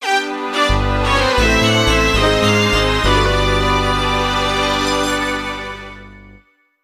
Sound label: Game Over Theme; PMTTYD